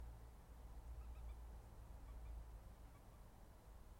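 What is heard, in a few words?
Moorhen call, Cheap USB mic and computer; nocmig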